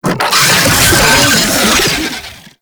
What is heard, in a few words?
Summon Vocal; Warframe; Uriel; Catenach